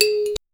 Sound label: african inspired pop sound, shaker